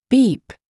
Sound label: beep original